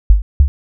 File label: cinematic heartbeat 60bpm